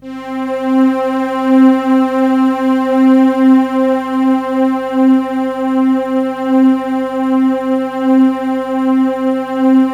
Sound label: PAD C4; USB Soundscan vol.05 - Explosive Jungle; AKAI